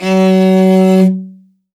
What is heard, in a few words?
morin khuur, f#2; World Instruments 2; PS MORIN KHUUR